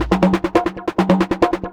drm 138, Techno, drum-loops; Techno ID

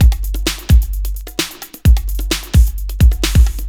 drum 130 electro, drumloops

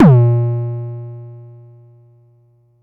Bassdrum, Jomox Xbase09

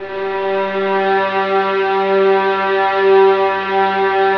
VIOLINS A, Synclavier Strings; ILIO

